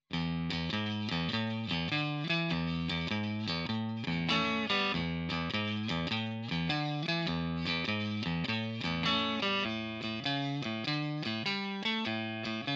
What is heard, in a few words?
Fender Neck Clean; Gitarrenvergleich